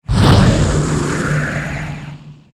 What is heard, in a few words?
Flame Breath FLAME; Evolve; Meteor Goliath